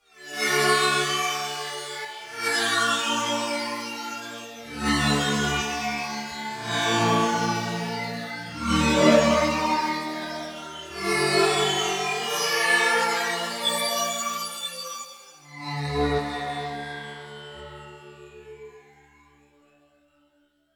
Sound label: Photon Phasers, Roland VC-1